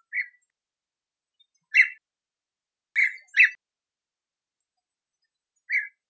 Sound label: call, bee-eater; merops apiaster